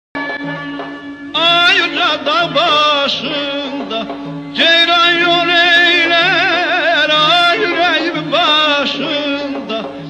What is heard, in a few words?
azari saz